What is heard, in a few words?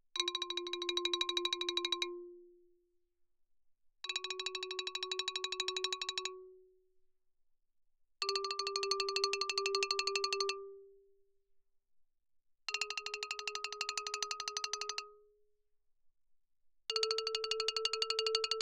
xylophone rosewood roll ff F4 B4; Percussion